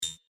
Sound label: Single Click; Andrew Fleming